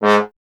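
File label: bass trombone G#; Miroslav Vitous; Solo Instruments VOL-1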